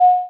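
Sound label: Beep Reminder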